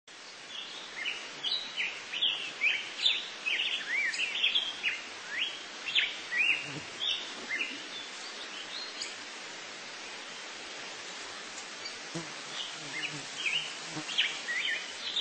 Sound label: Black-headed Grosbeak; Birds